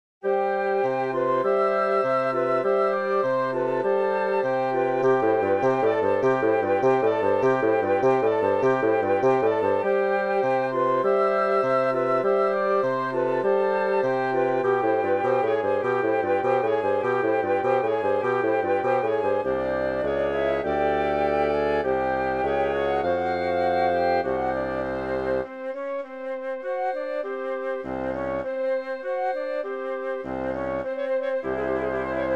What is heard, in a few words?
wind adventure, woodwind